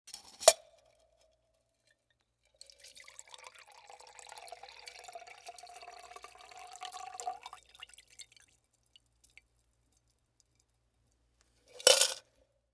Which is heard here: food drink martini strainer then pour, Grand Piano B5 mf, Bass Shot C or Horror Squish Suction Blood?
food drink martini strainer then pour